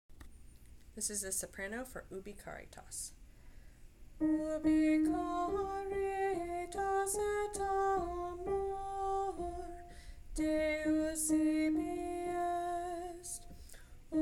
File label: Ubi Caritas soprano